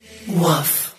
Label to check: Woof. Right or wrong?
Right